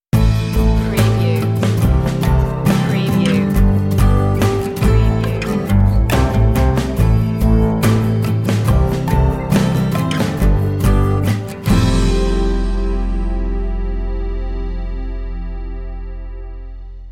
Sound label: mellow guitars stinger